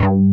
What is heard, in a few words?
NIGHT BASS, Synthsonic; Pro-Rec - Synthsonic; BASSES